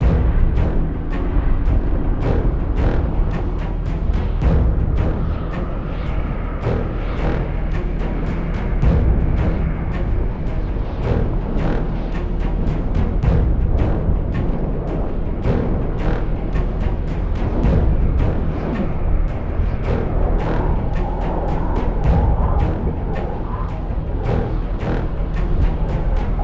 Race Music; Racing; lego universe

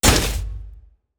shield stun

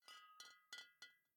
Bottle Shake; The Town

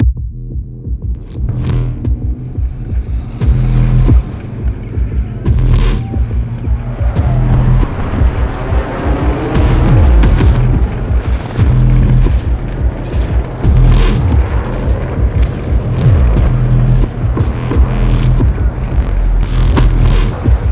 Playground Music Loading; Battlefield 4

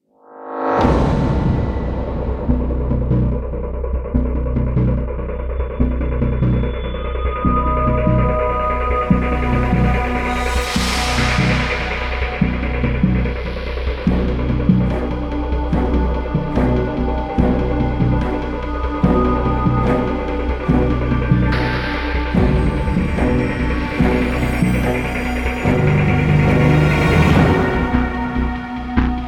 Combat Dogfight; Elite Dangerous

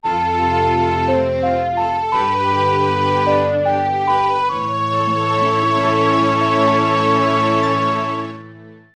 Get Fairy Ocarina; Legend of Zelda Ocarina of Time; n64

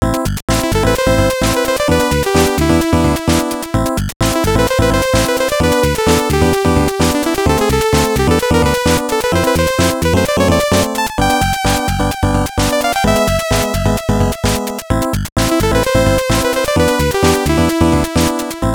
BGM, TurboGrafx-16

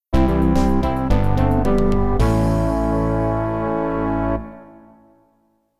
Jingle (MT-32)

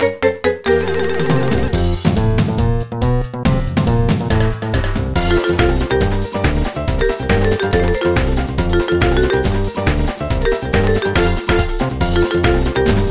Failure; donkey kong jungle climber

